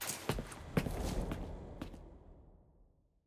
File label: collection archive return click; caitlyn